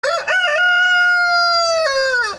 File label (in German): Hänekrähen